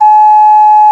OKARINA GIS; FLOETE; Best Service; Alpine Volksmusik

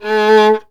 GEIGE; Best Service; Alpine Volksmusik

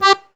AKK VIOLSK, akkordeon; Best Service; Alpine Volksmusik

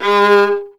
GEIGE; Best Service; Alpine Volksmusik

